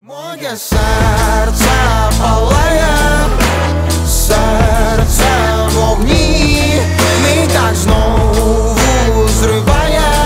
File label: laud